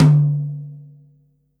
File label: hanging tom mid, rock drum kit